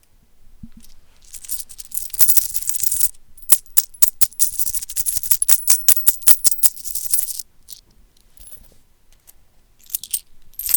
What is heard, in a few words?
english coin jingles